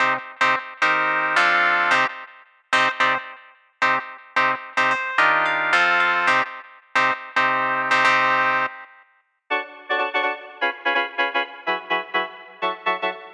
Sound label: Funk